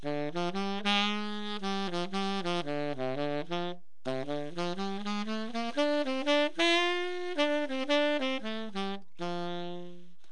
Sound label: sax